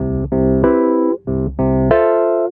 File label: Wurlitzer; Rondo Brothers; Sing Into The Machine; Sonic Foundry (Sony Creative Software)